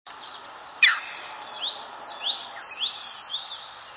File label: heron green flight call; birdsongs